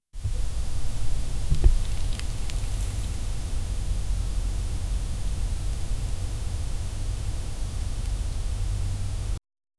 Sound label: forest ambience with leav